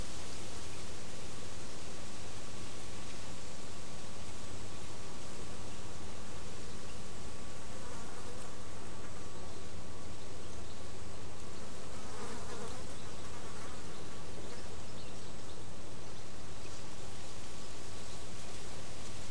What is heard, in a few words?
Wind at coast